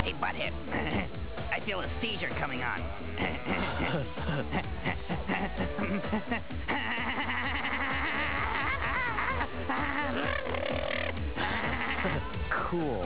seizure

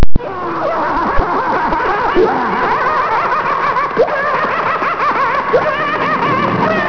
LAGH

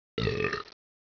BELCH

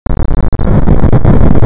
rumble; QUAKE

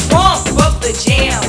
PUMP JAM

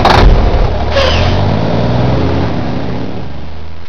BUS

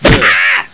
AHHHHH

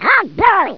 connect

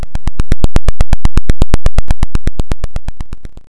CHOPPER

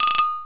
TIME WARN

